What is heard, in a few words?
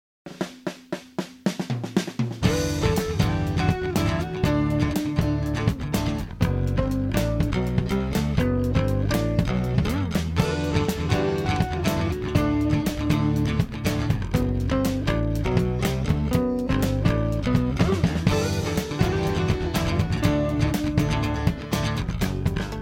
light and funky WITH GUITAR